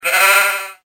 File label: beeeeee